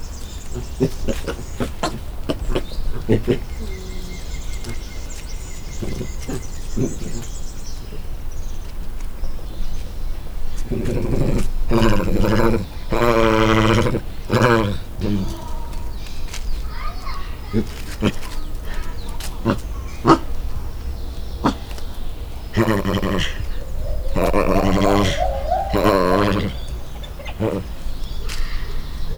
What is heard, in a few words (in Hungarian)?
morgolódás fele, emse makákó; debreceni zoo 2012; marantz puska